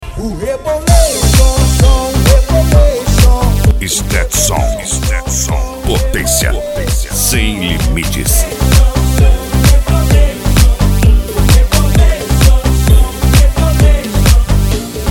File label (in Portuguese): sound car carnaval